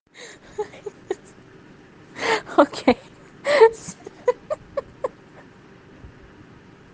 laugh

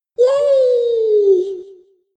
yay echo

